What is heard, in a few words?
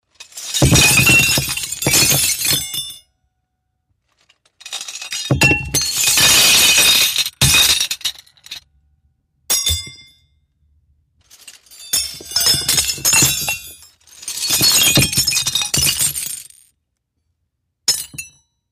Cutlery China, clay & ceramic